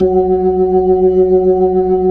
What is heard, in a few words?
B-3 F#4, Jazz; Keyboards of The 60's and 70's